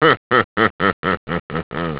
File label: laugh; Amiga Soundtracker sample